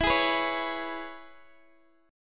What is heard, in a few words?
E 6th, down, Guitar, Open D